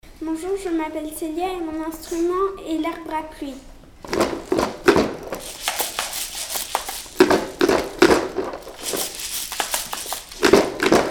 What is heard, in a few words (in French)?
arbre a pluie